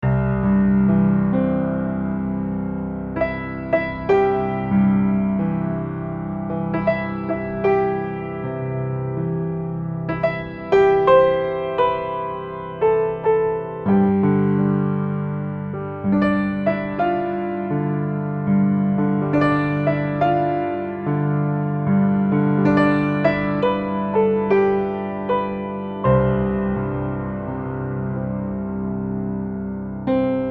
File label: LULLABY